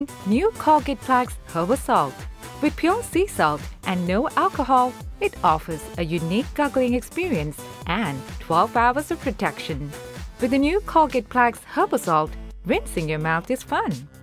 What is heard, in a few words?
Gargle; Colgate; Guess The Gargle